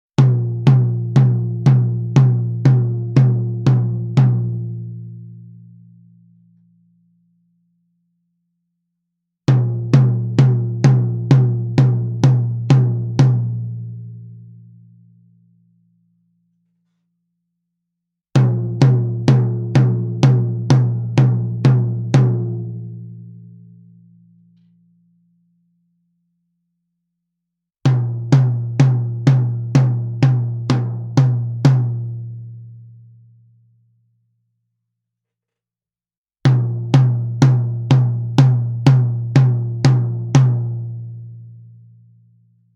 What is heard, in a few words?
SM57 alle Pos